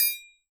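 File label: triangle, Synclavier Percussion; ILIO; Synclavier Percussion 2; World & Orchestral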